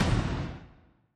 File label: tone; Fourth; seasonal